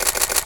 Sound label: shutter click